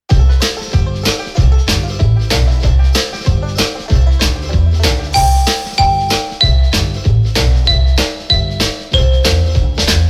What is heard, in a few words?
Farm Xylophone; Jakers